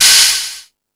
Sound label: Found Sound, Drum Machines